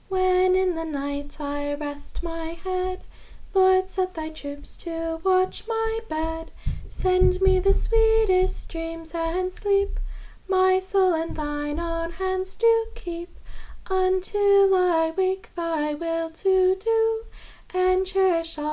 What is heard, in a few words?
Childrens Song; illuvian childrens prayer song